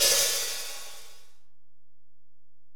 HiHat, Alesis DM-PRO

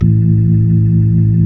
B-3, Keyboards of The 60's and 70's; Club Xfade

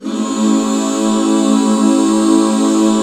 A 7, back chords; Sound & Vision - Gigapack II CD 1

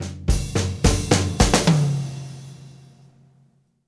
Fills, Monster Beats; Zero-G

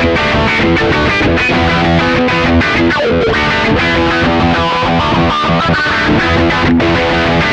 THRASH WAH, Funk Guitar; 90 s Sample CDs; Zero G

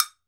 TOKE BEL, African Drum Percussion; E-MU Producer Series Vol. 7 – Old World Instruments

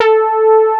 SB HORN; Wizoo - Powered Nord; STEREO LAYER

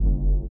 LIQUITAR; Spectrasonics; Metamorphosis

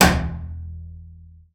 BING E1, BASS FINGER; Xsample VOL-5

